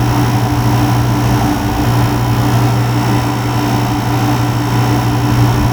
Machinery Air Loop; Props; Warframe; Tau; Ambience

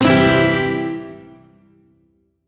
Shawzin Phrygian Chord A; Warframe; Duviri

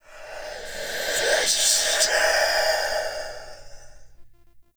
Binaural Whispers; Warframe; Shadowgrapher

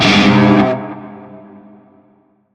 Shawzin Phrygian Chord K; Warframe; Narmer Shawzin

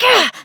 Pain, Adult Operator; New War

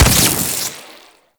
Adhesive Fire; Warframe; Corpus; Arachnoid Hunger; Abilities

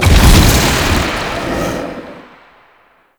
Cave Combat Shadow Despawn; Warframe; Duviri Murmur; Cave Combat Encounter

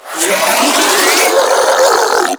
Infested Ancient Melee Mid Spin Scream

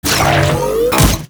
Mutalist Speaker Start Scream; Warframe; Proto Infested; Abilities

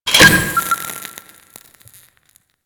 Insert Fomorian Corruptor, UI; Warframe

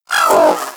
Zanuka Companion Vocal Pain; Warframe

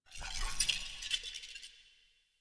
Movement F; Erra; New War Stage Two; Warframe; The New War; Cinematics

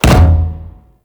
Breakout Hit One; Amalgam Carrus Pilot

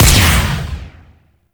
Pistol Charged Shot; Operators; Drifter

